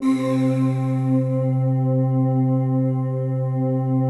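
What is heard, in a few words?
PAD, atmospheric pads; Best Service; XX Large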